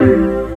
Chords Tones; Equipped Music; Loungin House

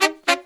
Horns 110BPM; Zero-G; Phantom Horns

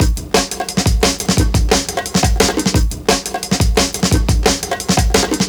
Classic Remastered Jungle Breaks, A, 175

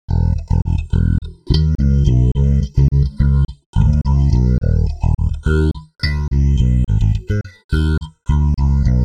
BASS CLEAN

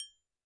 percussion, Roland TD-20

